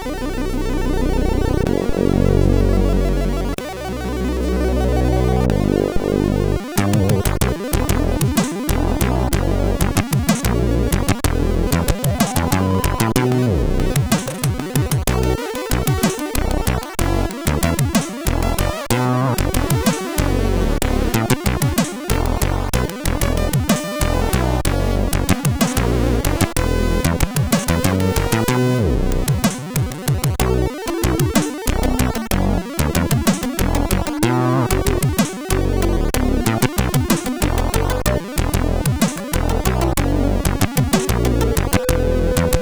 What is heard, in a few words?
sid, CSG8580